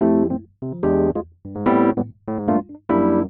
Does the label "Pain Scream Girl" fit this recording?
No